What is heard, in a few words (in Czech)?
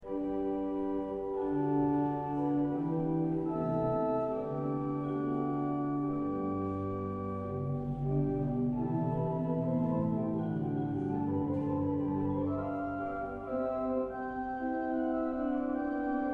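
varhan chórových; Žlutice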